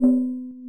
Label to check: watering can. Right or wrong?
Right